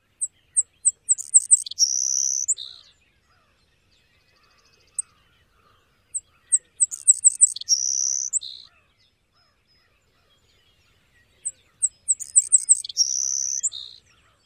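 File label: savannah sparrow songs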